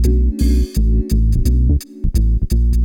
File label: BASS 85; E-MU Formula 4000 Series Vol. 1; Hip Hop Nation; TriggerThis! 85